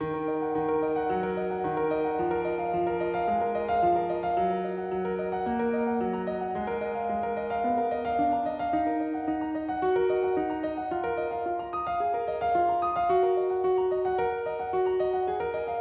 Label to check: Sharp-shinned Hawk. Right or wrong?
Wrong